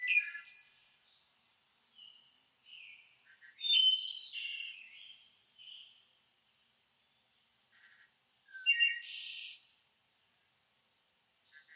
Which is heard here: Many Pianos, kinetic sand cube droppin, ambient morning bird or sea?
ambient morning bird